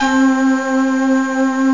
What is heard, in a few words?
newagepd, tones